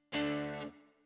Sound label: GTR Royer